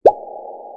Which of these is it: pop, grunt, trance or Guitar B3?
pop